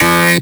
Synth Voc; Synth Shots; Vengeance Samples

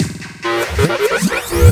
Fill 140 BPM

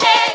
Vocal Oneshots; Vengeance Samples; Vocals

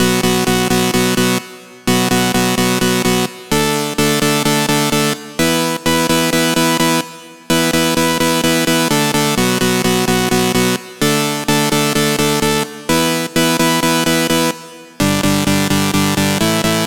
Male Sand Of Love Saw Lead, 128 BPM; Vengeance Samples; Vocal-Hook Kits; Song Kit

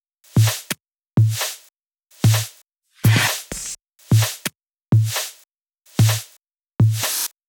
128BPM Drums; Vengeance Samples; Economic Kit

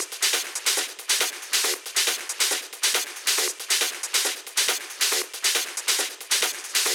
Manhattan Kit 138BPM Loop, Drums & Perc; G#minor; Vengeance Samples

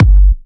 130BPM Kick

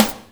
snare; Breaks N Beats Sound Kit; Beatz Kit

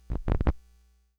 Needle Pop, Vinyl Record Samples; Needle Noises

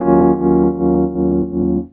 rhodes A#, vocal house; PS-28 Vocal House Samples

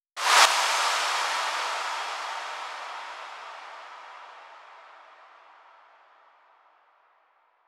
Space Of Time Kit 140BPM Reverse FX; G minor; Sound FX; Vengeance Samples

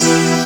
Synth Shot; Vengeance Samples

